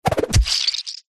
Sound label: warfare throw knife spin hit body squelch